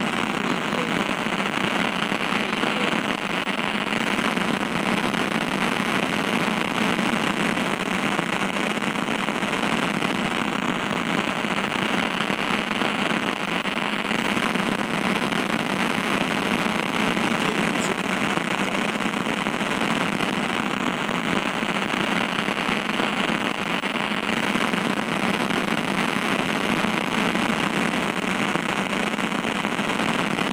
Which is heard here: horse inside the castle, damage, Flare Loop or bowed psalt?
Flare Loop